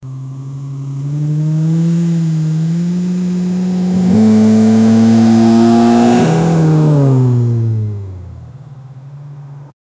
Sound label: supercar rev